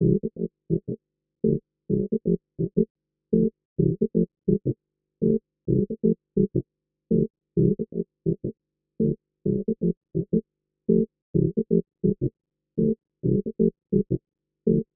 nord sourd reprise resonance

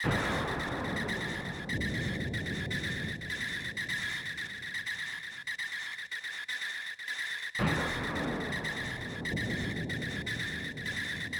FX 2 sons frein boum

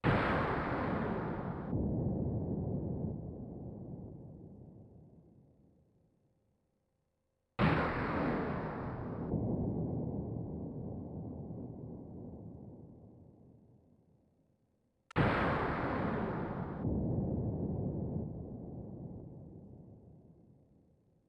FX seul explose

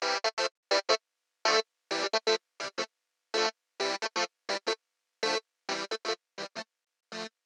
synthe medium avec reso

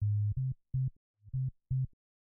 basse intro

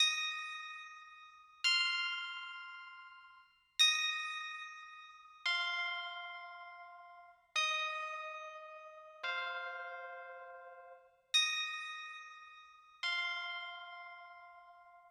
melod aigue

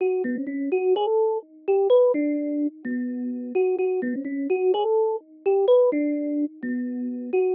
melod aigu seul

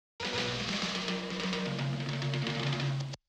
break batterie sample

